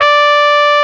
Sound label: Trumpet, d5; BGM; Nintendo; July 2020 Gigaleak